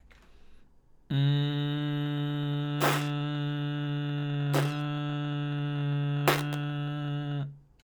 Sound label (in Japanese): HBB clap koeari n teari, ヒューマンビートボックス; 前回よりも少し難易度を上げて、「同時に声に出す」という練習もしていきます。